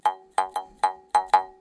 jaw harp recorded live, in a small apartment studio